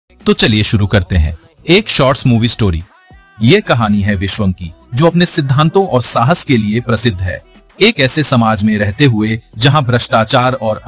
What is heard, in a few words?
hindi action bollywood song; cartoon